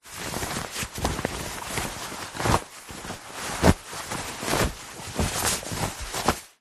foley clothing jeans denim pants trousers handling movements rustle